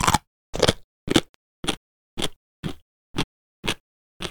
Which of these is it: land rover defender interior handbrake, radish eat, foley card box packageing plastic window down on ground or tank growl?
radish eat